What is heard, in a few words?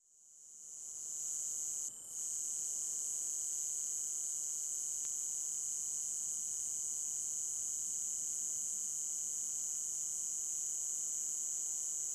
Single Cicada Cu Mult; cicadas